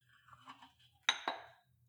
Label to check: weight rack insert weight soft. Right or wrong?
Right